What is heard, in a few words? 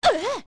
comb blast; OOT